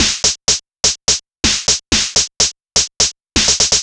perc sixty 125, Percussion Loops, Classic Chicago House